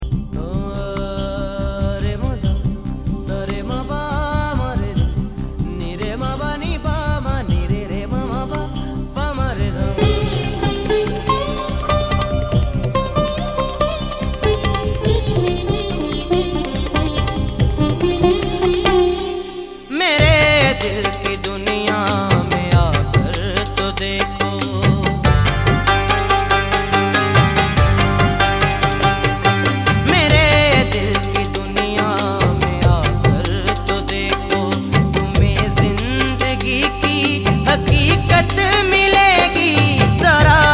Sad Song Ringtone

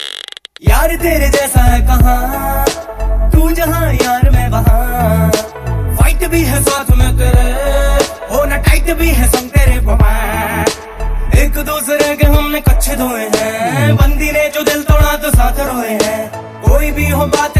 Hindi Song Ringtone

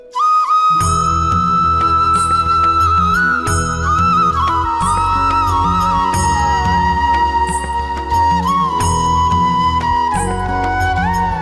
Krishna Flute Full Ringtone; Mahabharat

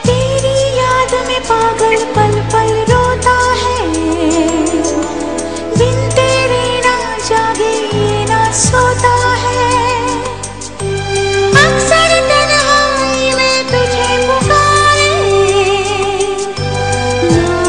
Old Hindi Song Ringtone

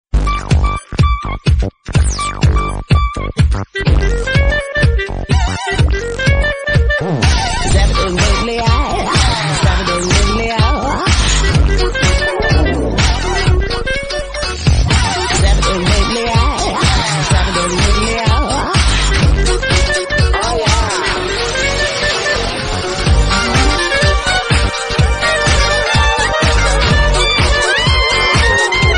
Old Nokia Ringtone; ringtones

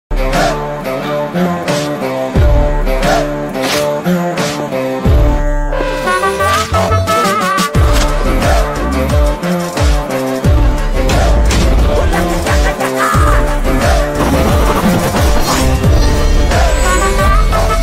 Malayalam Movie Bgm Ringtone; Michael

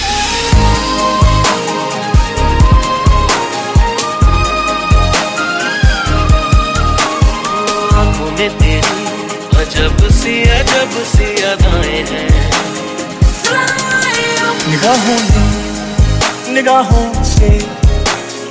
Romantic Remix Bollywood Songs Ringtone

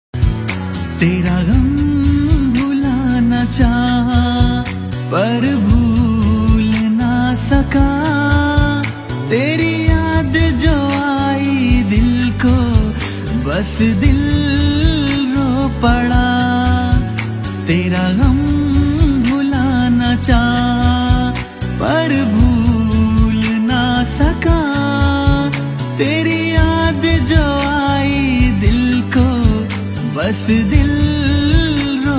Sad Song Ringtone

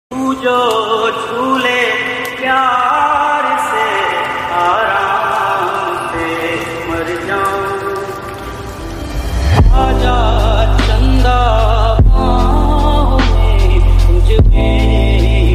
Bhakti Ringtone; Krishna Bhakt Meera

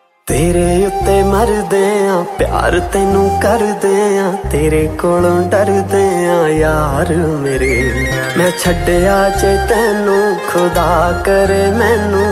Punjabi Romantic Song Ringtone

